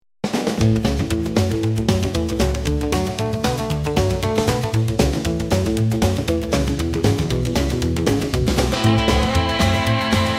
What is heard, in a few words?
rebel road trip, rockabilly